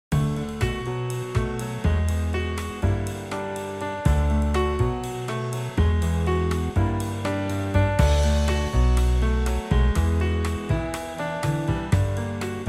trio

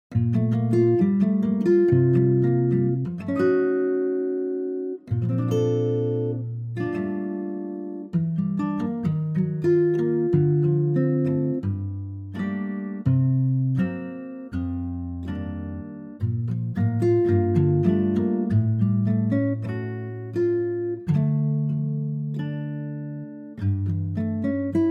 A Bb Trio

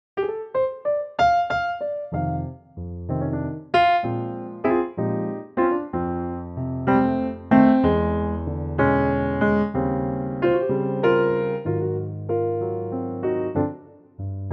F Piano; key - F - vocal range - C to Db; piano only arrangement; The well loved classic standard in a piano only arrangement, perfect for a blues based vocal to dig into!

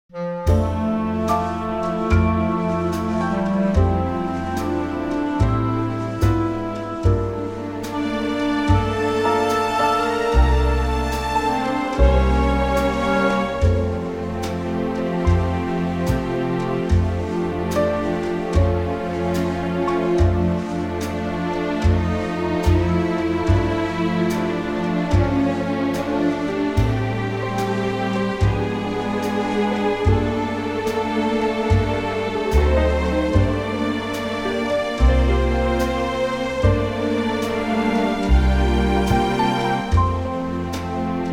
orchestral; F; key - F - vocal range - C to D; Stunning orchestral arrangement of this very lovely 1930's song

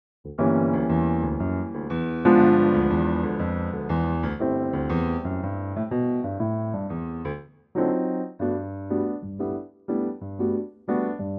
G piano; key - G - vocal range - D to D

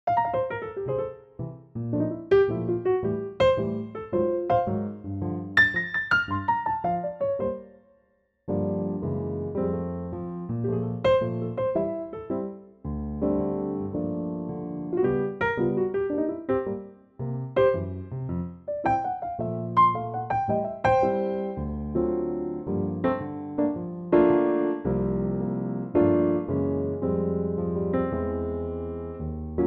F piano; Pianotrak; key - F - vocal range - C to F; Wonderful and popular tune in a mid tempo 2016 Pianotrak arrangement

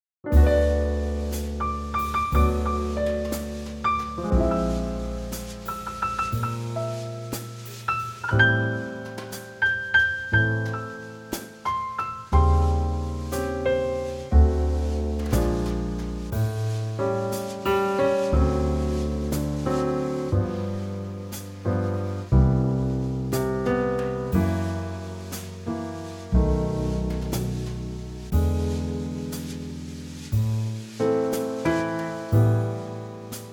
G Trio

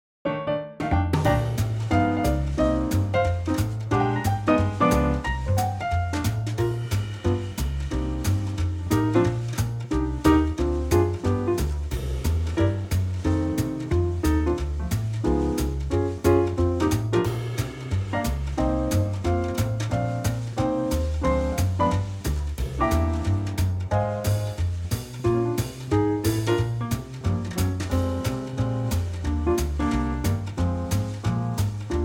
trio; key - Bb to C; Great opener; cool key change; New 2014 TRIO arrangement

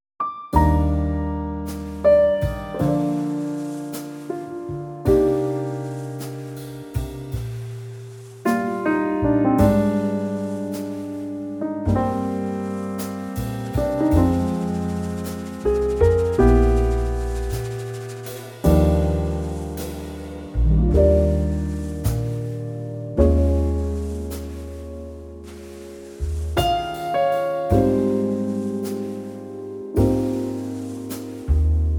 trio Eb

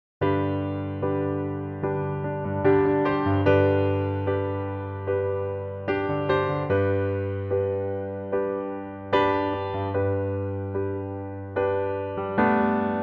G piano; key - G; Pianotrak; Fantastic piano only arrangement

